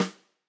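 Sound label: Tam Snare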